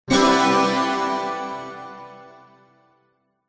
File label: multimedia game sound happy bright warm positive tone